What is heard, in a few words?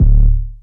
808 quick Eb; 808s